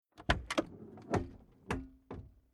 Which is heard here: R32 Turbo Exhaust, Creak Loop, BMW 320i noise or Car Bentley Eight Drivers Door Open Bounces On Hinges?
Car Bentley Eight Drivers Door Open Bounces On Hinges